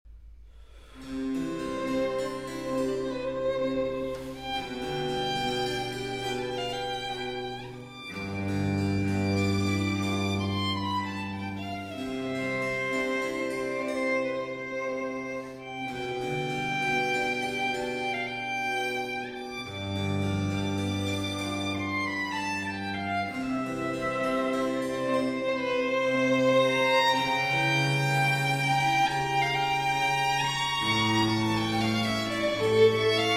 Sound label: Violin Concerto in A Minor Largo